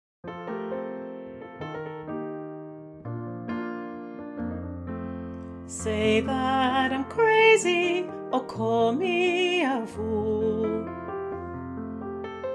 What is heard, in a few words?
SOPRANO, choir; Learning Track; the peoples show choir